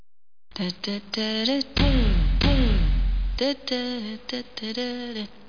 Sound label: dadada